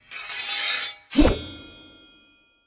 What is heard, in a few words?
Ammoutjudge Sword